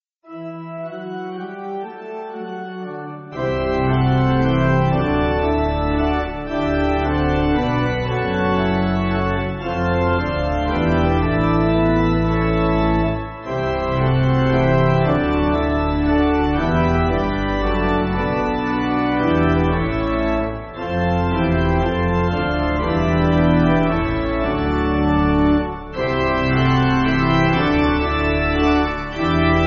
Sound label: Near Pipe; 1982 Hymnal